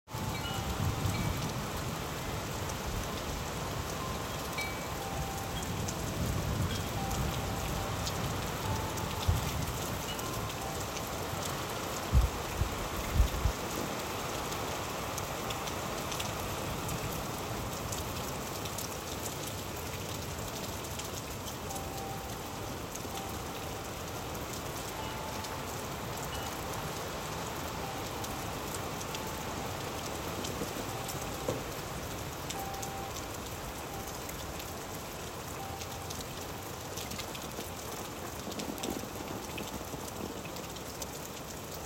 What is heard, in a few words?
wind chimes